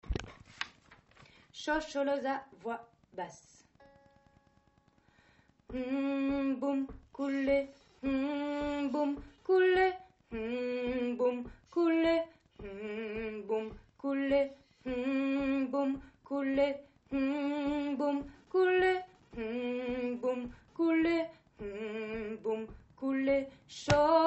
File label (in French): Basse; Shosholoza